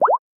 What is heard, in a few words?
new conversation